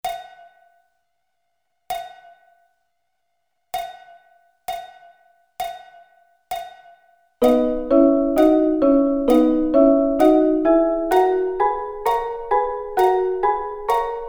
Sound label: B Double Stops